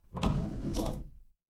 door open

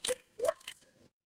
water drip

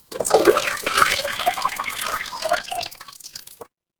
water pour

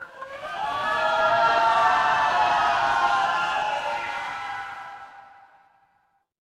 crowd cheer

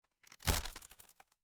impact crate break

